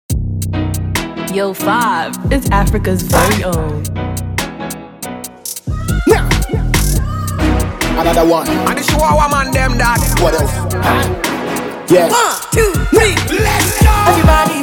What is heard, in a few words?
Drill Version